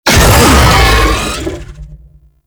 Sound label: Infested Vocal Pain; Warframe